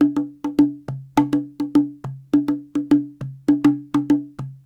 Cahon

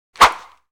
swish single; Asian Show Sound Effects

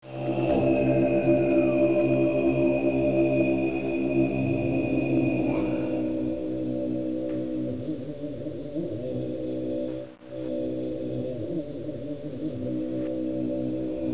monks

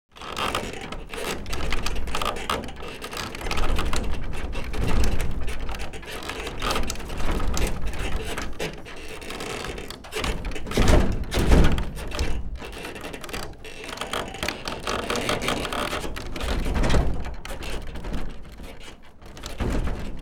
metal stress rhythm, foley effects; Asian Show Sound Effects

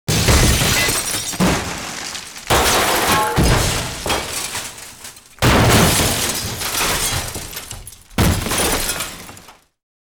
impacts large, foley effects; Asian Show Sound Effects

